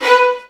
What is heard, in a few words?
VN FX, Virtuoso Strings; ILIO; Kirk Hunter Virtuoso Strings VOL-1